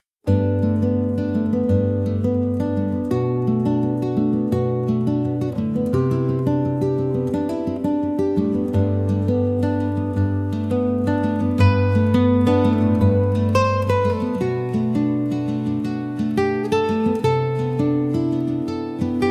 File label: acoustic chill